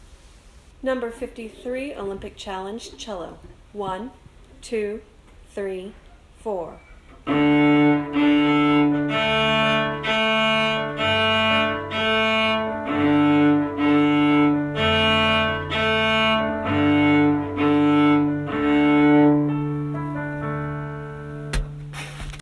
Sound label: cello